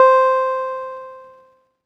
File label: Jupiter-8 KEYS Space - C; Synth; One Shots; Cymatics; Fantasy Synth Sample Pack